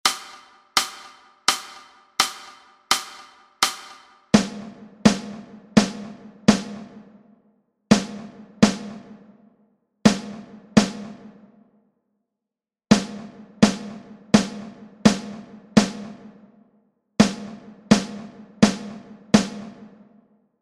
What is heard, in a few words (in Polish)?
dyktando rytmiczne; Lekcja 21